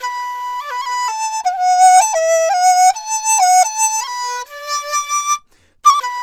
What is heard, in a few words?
DIZU 80 D; INIS - Opium